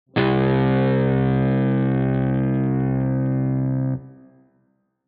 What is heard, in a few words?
c power chord